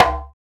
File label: African Perc